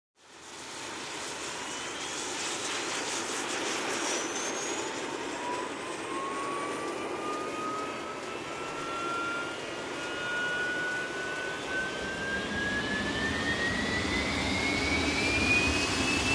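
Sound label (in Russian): реактивный истребитель запуск двигателя глушение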